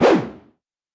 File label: bullet impact woosh